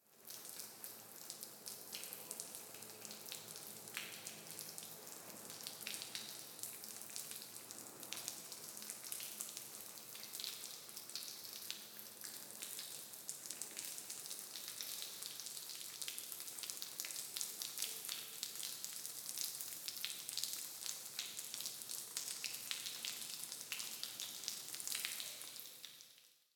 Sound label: Water Spray Drops Streaming Against Cement Water Hose With Heavy Reverb; Hollywood Edge; sound designer's toolkit v2